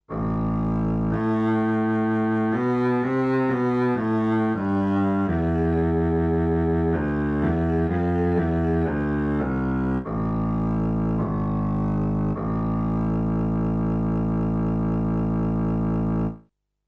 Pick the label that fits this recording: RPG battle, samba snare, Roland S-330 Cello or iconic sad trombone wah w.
Roland S-330 Cello